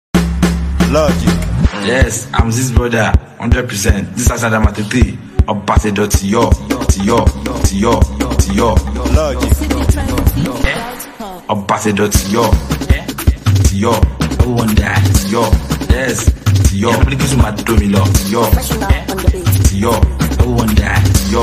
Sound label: Professional Beat